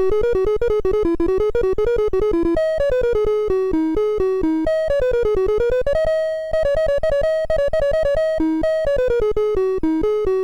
sid MOS6581R3

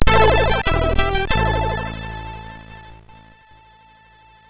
sid, MOS6581R2

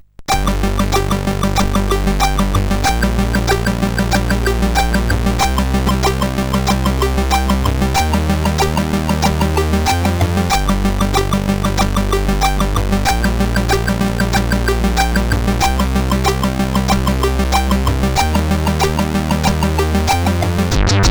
Drumtakt, CSG8580R5

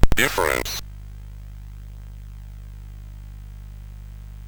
sid CSG8580R5; Cave of the Word Wizard Set 4

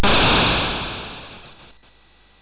Ryshka, sid MOS6581R2; GAMES

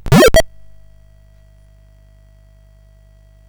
sid CSG8580R5; Frogger II-Three Deep

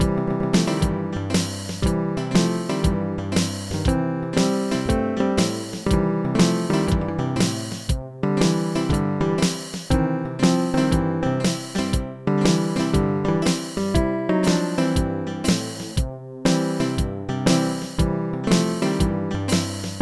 country style; SuperJAM!

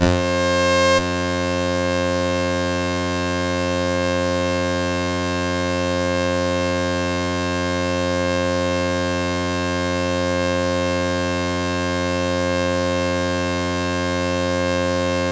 sid CSG8580R5; Bird Mother

